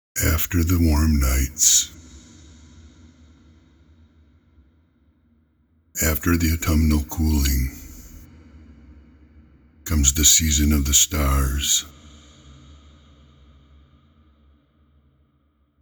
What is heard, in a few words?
Vox WET